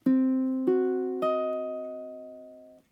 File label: chord c fis dis, ukulele